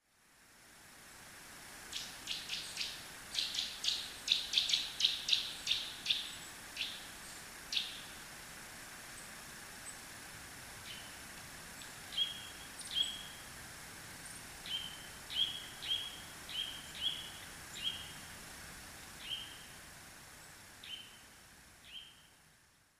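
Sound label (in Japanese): gojukara, nuthatch; chihcibu; koe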